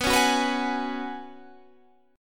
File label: Gb Suspended 2nd Suspended 4th B